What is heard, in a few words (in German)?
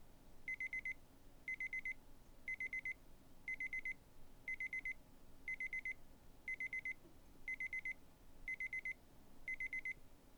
Timer